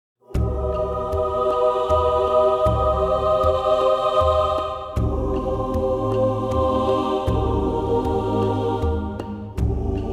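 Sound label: Eb, male; key - Eb - vocal range - Eb to Ab; Beautiful choir arrangement of this timeless carol, with percussion added.; Vocalville; xmas female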